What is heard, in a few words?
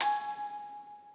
musicbox